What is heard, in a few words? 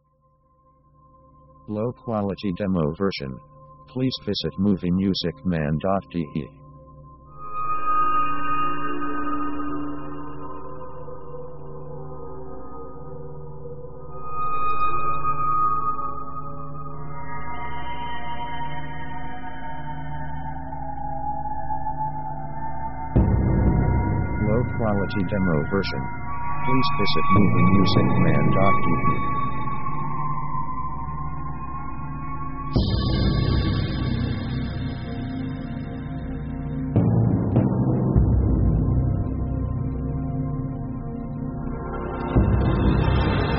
slow horror ambience; background; Background themes